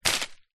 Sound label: tree leaves branch thin hit; foley; zapsplat